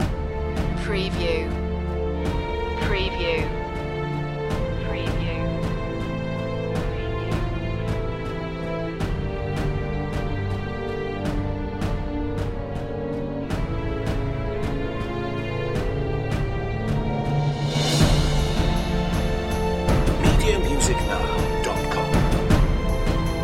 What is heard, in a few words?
no choir